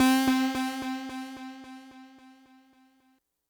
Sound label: Synth C, Synth Prophet One Shots; Electronisounds